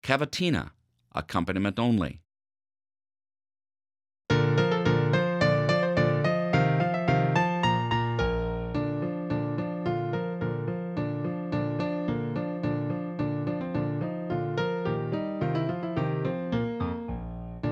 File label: accompaniment only horn